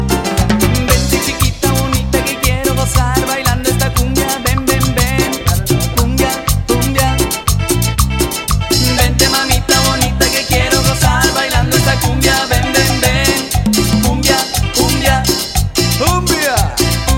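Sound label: Cumbia Spanish Ringtone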